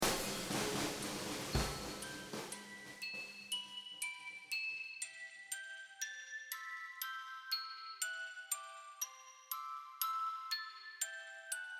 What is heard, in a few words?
Music Box